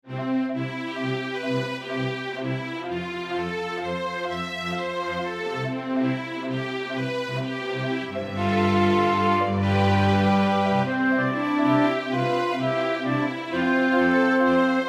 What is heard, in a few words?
cinematic